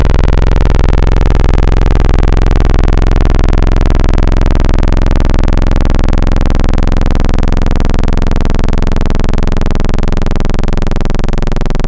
saw; Synthetic's Serum Collection; Echo Sound Works Core Tables; ESW Basics - MB Saw

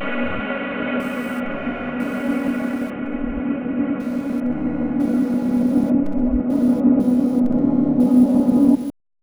Android, Noises; Synthetic's Serum Collection; HYPERBITS

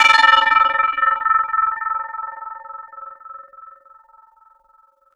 Noise Schools Out; Synthetic's Serum Collection; Echo Sound Works Sphere

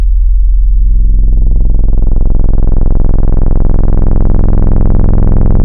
Sub Sine; Wavetables; Synthetic's Serum Collection; ESW Core Wavetables; Echo Sound Works Core Tables

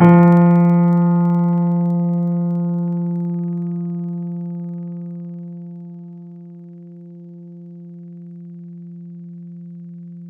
toy piano; wavetables; Instruments; Synthetic's Serum Collection; ESW Core Wavetables; Echo Sound Works Core Tables; ESW Real

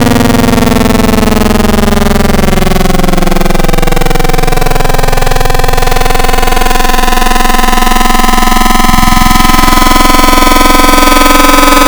Fm Gime Saw, Xfer Serum Wavetables; Synthetic's Serum Collection; Cymatics - Nuclear for Xfer Serum Wavetables

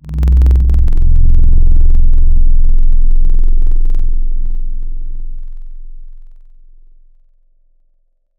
Panflute, Wavetables; Synthetic's Serum Collection; Standalone-Music SYMPHONY Wavetables

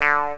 The 60's USA beep, Windows 98

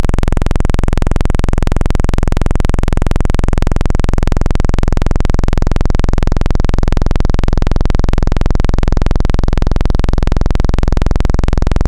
clean saw; Synthetic's Serum Collection; Echo Sound Works - Modular

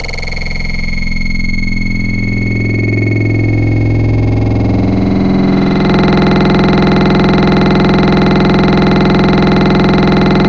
growl grizzly; Synthetic's Serum Collection; Echo Sound Works Core Tables

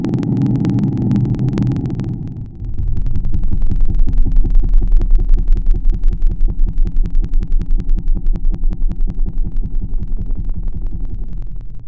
Moombahton; Synthetic's Serum Collection

